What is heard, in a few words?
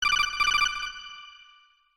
Radio; Metal Gear